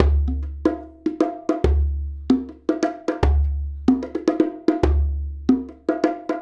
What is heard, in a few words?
Djembe and Bongos